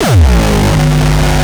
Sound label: kick; shock. Ultimate Kicks vol. 2